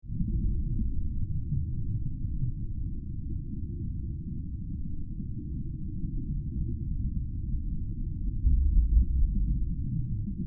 water underwater deep; Underwater Ambience; River; Nature; Rivers; McKinney Sound

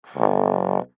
Cartoon Baritone Play Airy Note Short Musical; Blastwave

